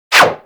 Bullet Parry Metal; Impacts; Bullet Parries

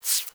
Foley Idle Lick Furr; Enemies; Warframe; Catbrow

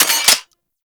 Heavy Shotgun Mech; Warframe; Tenno

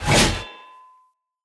Cleaver Throw, Swords; Weapons; Player; Bromius Cleaver

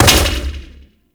Grenade Bounce; Warframe; Weapons; Corpus

